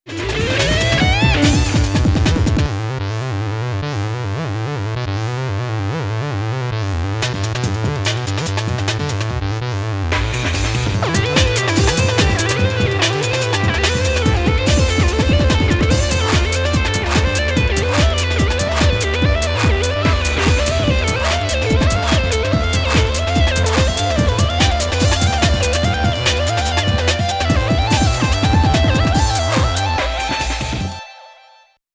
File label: Electronic